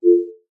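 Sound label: science fiction iris or fingerprint scanner light swipe; zapsplat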